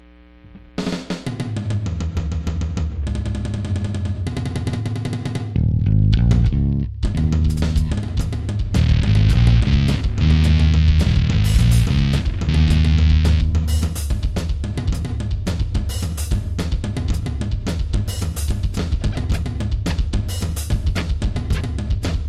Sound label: Drummy no strummy